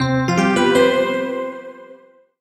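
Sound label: Solo Electric Piano Positive; MUSIC EFFECT; startide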